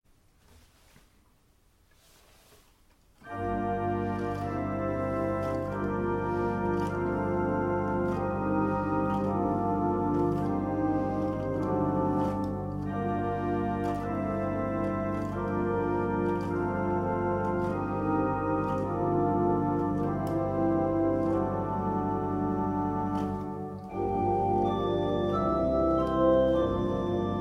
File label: Hymn Prelude; Love is come again